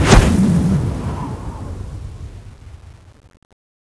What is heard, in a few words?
ironfan slash, weapons